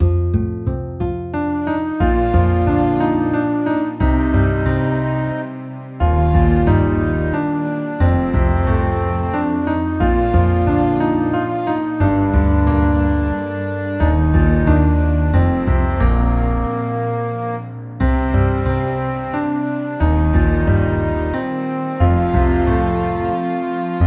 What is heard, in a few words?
Lullaby